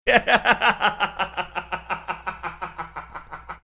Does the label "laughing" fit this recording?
Yes